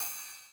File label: Ride Accent Max Decay, 909